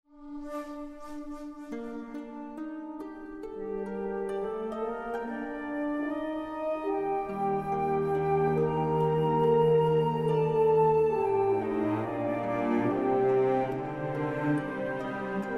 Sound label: Music; Microsoft Studios; Age of Empires III - Complete Collection; cinematics